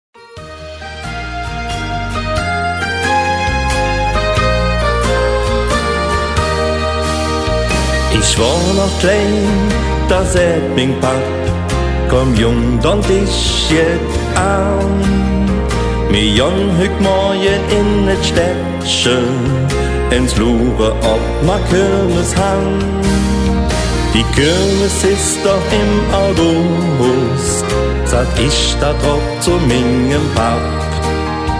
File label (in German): Glockenspill vom Muensterplatz